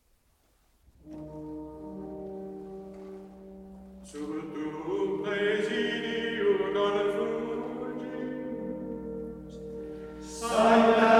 gregorian chant sub tuum praesidium antiphona tonus monasticus; in honorem beatae mariae virginis; Marian hymns